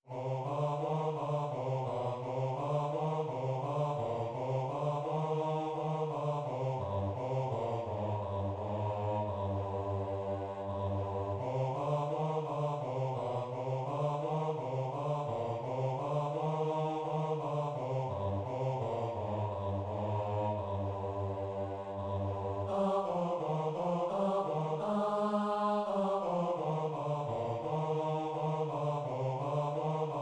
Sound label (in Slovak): bas, zborove skladby; vianocne